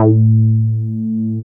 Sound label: BASS